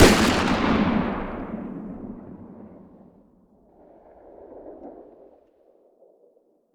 fire dist 50ae pistol ext; gmod; arccw uc commons attachments

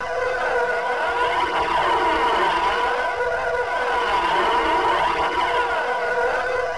tie interceptor; gmod; star wars vehicles episode 1

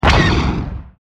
fire; gmod; lfs luna s flight school

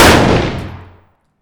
fire dist 308 rif int; gmod; arccw uc commons attachments

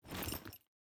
cloth; gmod; arccw uc commons attachments

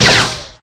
shield deflect; gmod; lvs framework

